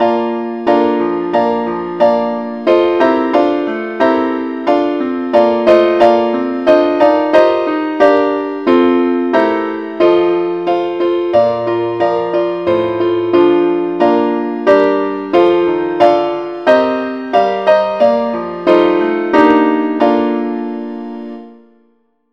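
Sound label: keyboard, key E flat; saintthomas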